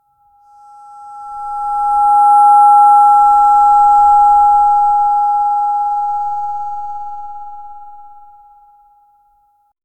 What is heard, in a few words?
haptic vibration sound